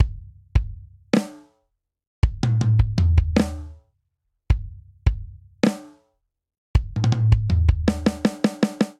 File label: Straight Six Rock Breakdown 6/8 80 bpm; Ableton; Drum Booth